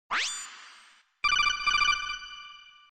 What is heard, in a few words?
metal gear solid codec